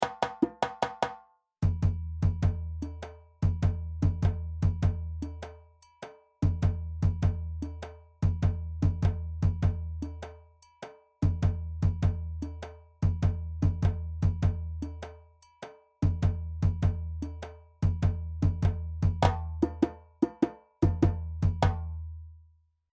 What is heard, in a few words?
Doundoumba + Djembe; Djembe 1